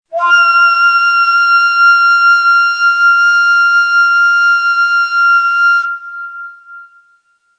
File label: Tiz Beyati, Neysample